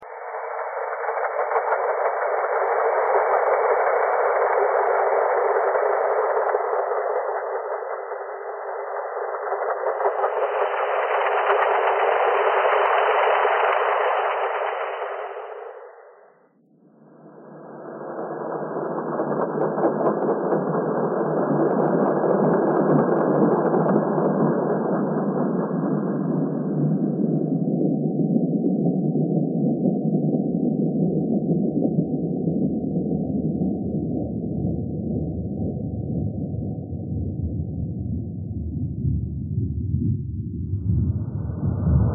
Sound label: thread pentatonic